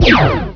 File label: gun; asciisec